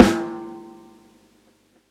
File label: LIVE O SNR, Snares